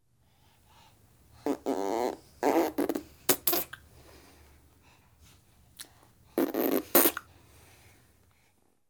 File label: 6 month old girl blowing raspberries (twice); Babies; The BBC Sound Effects Library